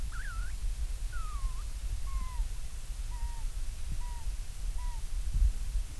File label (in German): fiepsen, octodons